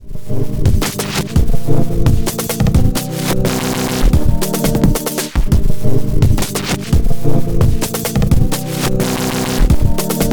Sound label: Pitch Shift Aliasing